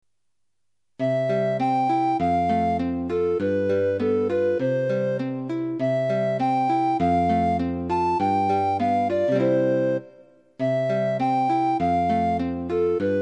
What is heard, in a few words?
etude gt